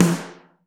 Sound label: SN Mono, Snare